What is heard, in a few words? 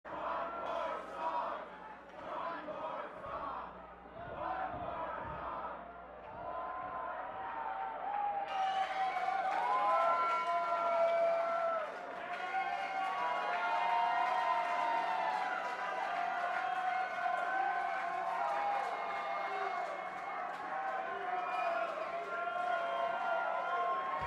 Crowd Banter